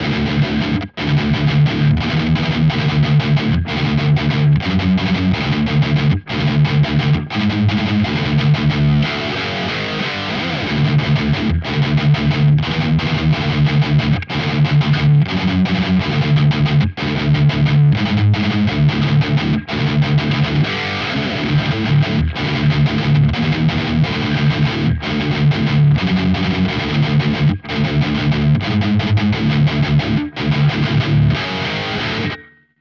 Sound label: Neural DSP Quad Cortex EV101III